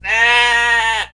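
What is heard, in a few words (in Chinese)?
yang jiaosheng, sheep bleating; jiachu jiaosheng; jiaqing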